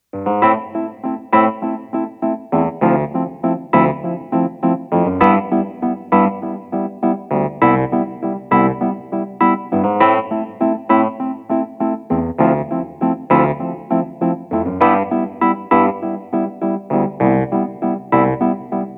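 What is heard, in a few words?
60s electric piano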